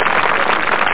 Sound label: Clapping